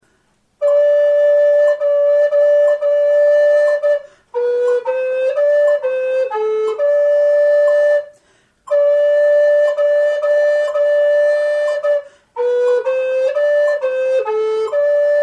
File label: Pavane G Em, FLUTE